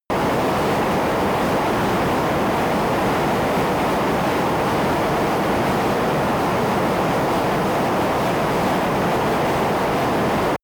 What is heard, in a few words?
military jet fly close rpg